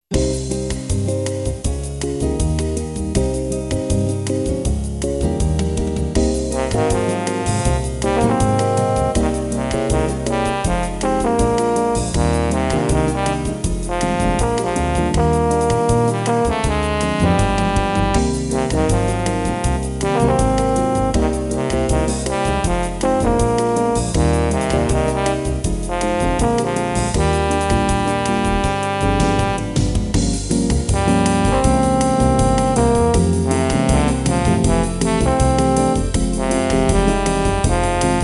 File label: Jazz